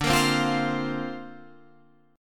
D Minor 7th Sharp 5th, D, down, Guitar Half Step Down